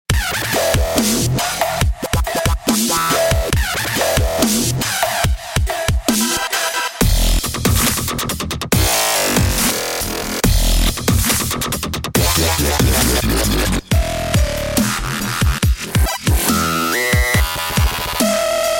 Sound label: Dubstep; kontakt